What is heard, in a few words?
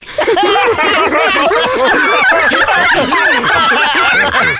End Chorus